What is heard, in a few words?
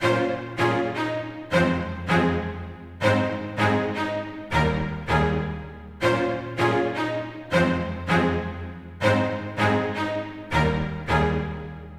String Loop 160 BPM A# Min; String Loops; Future Bass; Instruments; Scarlet; Cymatics; Future Bass Starter Pack